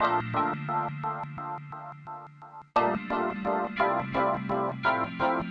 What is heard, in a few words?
Soulgroove, Funk Keys; Sonic Foundry (Sony Creative Software); Synchro Funk